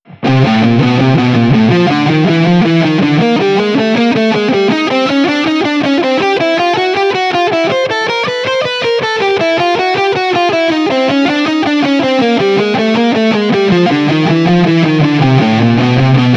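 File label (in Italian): Esercizio crom contrario corde adiacenti, chitarra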